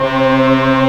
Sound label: Organ, Barton Bold&Bras